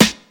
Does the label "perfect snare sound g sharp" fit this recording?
Yes